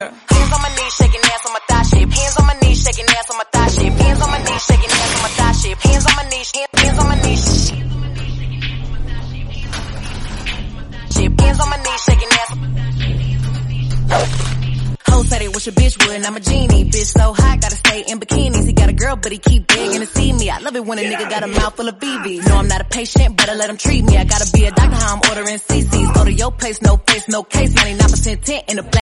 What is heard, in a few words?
hip hop song tone ringtone, English